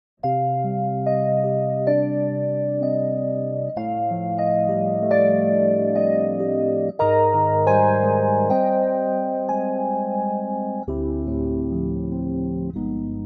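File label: Cm Arturia electric piano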